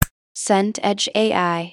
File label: snap fingers